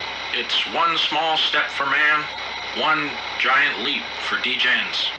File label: crash